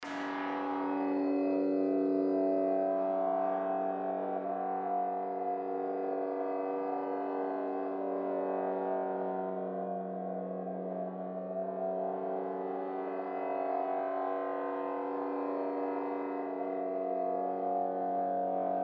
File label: CYMBOL SCRAPING